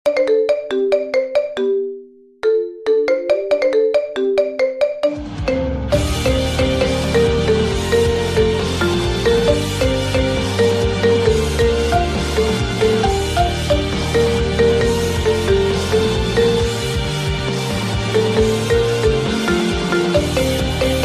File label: Marimba Remix; Tokyo Ghoul